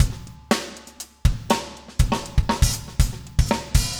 funk 120 bpm, loops

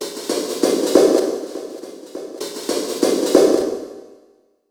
Mounting Beat, Electronic Beats

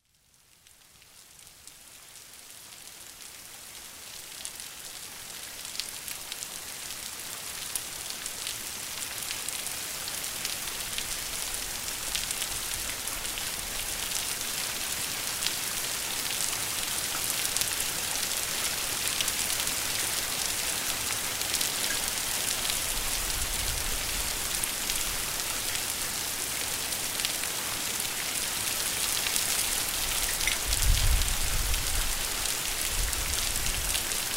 Thunderstorm Rain; Music For Sleep; Sounds Of Nature

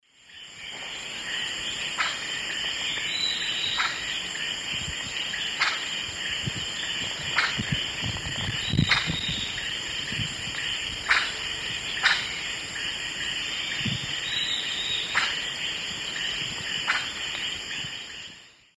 Nasica longirostris, alarm call; S. America; Eastern Lowlands

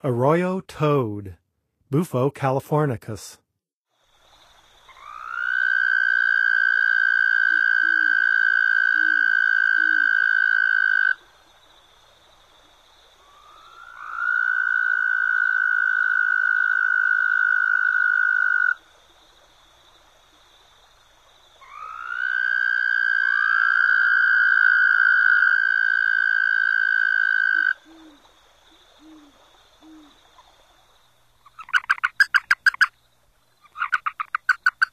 Arroyo Toad; N. America; Songs of Frogs & Toads of North America

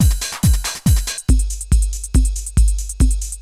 techno 140

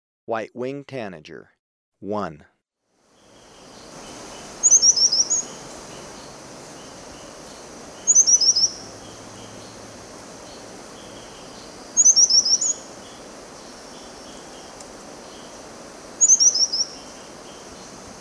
White-Winged Tanager, Birds of Ecuador; S. America

